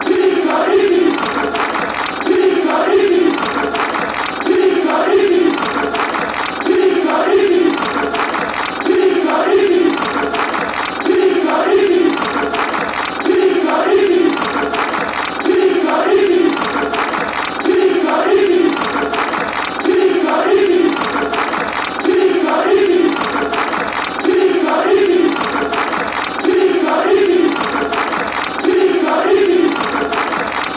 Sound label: chants; cigarini